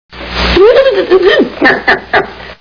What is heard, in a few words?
nyuk